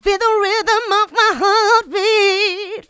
Female Vocals; Houseworx

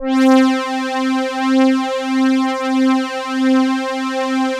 Elka Synthex Brass

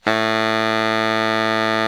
baritone FF A 1, Sax; Giga Samples Collection

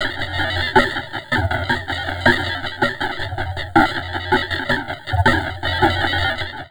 Drum Loops Crazy Processed

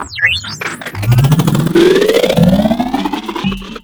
FX LOOP; Big Beat Samples & More; AKAI; FX LOOPS KIT

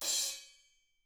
mallet; ILIO; Double Platinum Drums 2

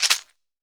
chekere; Africa

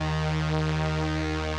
505, String Machines

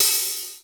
PWR OH, DrumBase5000, POWER KITS; Crypton

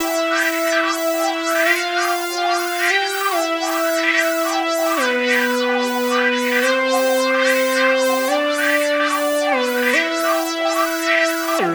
Power Synth; Club Joints and Street Anthems; Tornado Power Synth

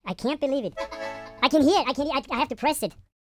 YODEL YELL; ILIO; Vocal Planet VOL-5

